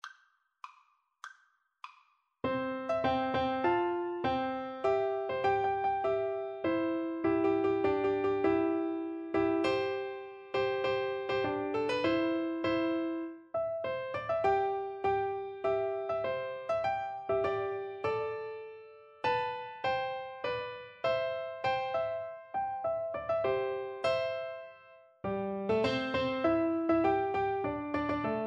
chinese anthem, Piano Duet

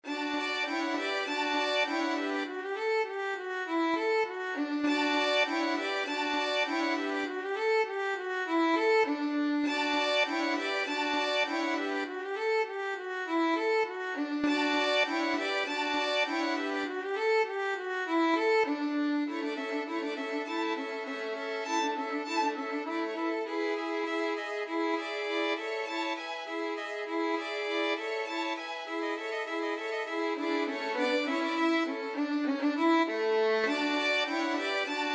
musette, violin quartet; bach

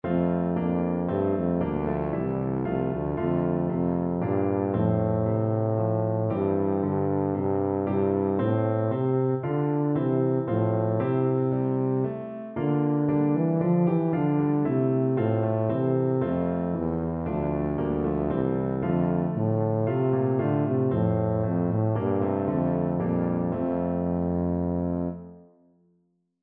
be thou my vision, tuba

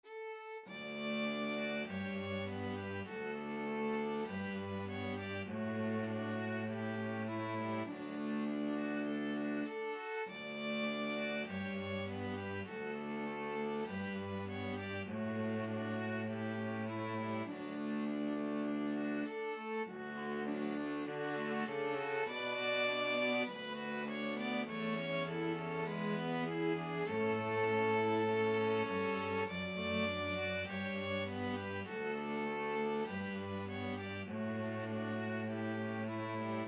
blue bells scotland string trio

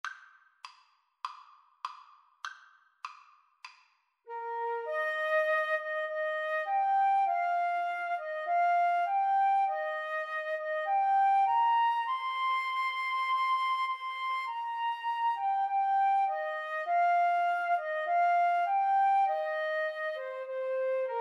auld lang syne, Flute Duet

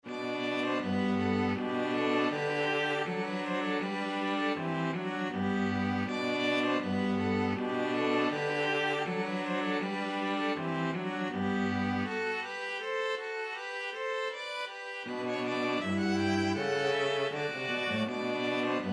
string trio

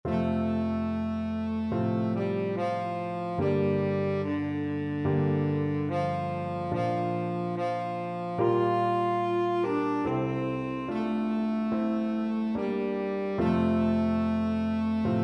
tenor saxophone

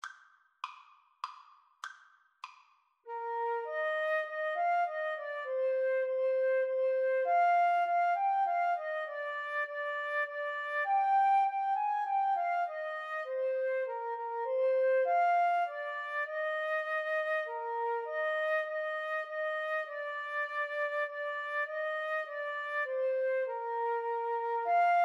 flute saxophone duet

